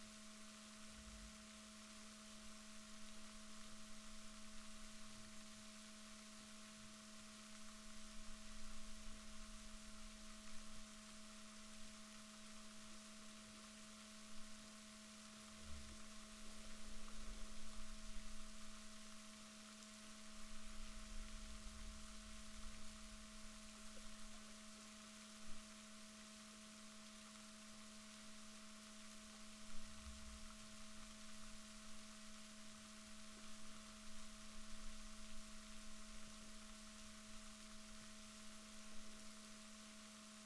rorquals negatif, Balaenoptera Physalus Chile

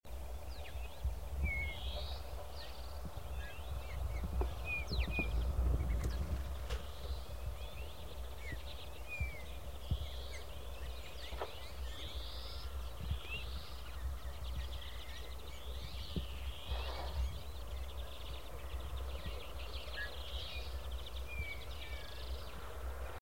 Pyrrhula pyrrhula; SETE

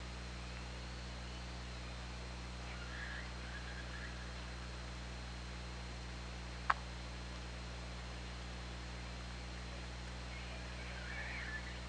OrcaLab

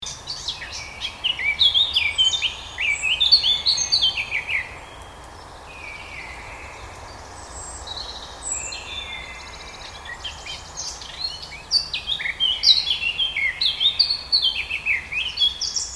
Sylvia atricapilla; SETE

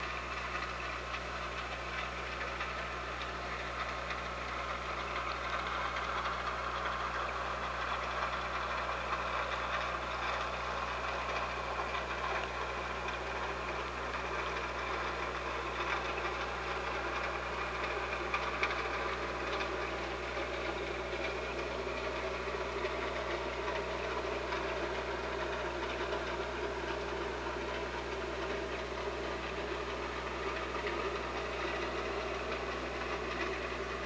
OrcaLab

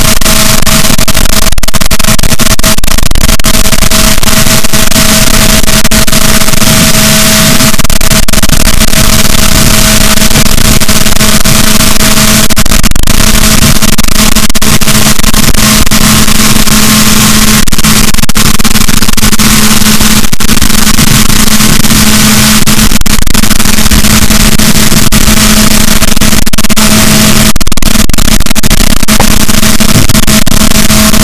rorquals, Balaenoptera Physalus, boat; rorquals positif; Chile

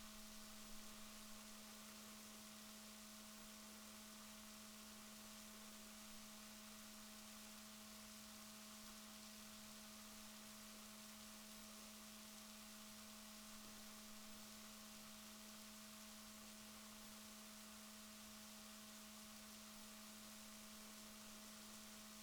rorquals negatif, Balaenoptera Physalus Chile

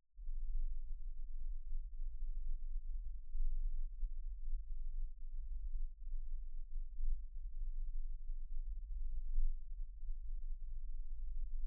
noise, fin; JASA2013

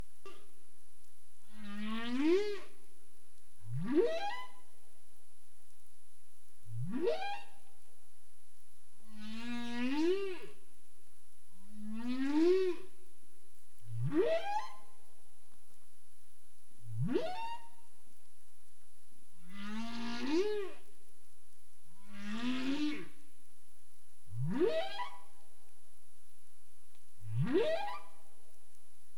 humpback whale; CARIMAM

